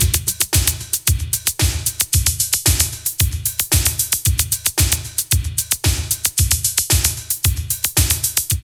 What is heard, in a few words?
LOOP, Tekno Industrial; East West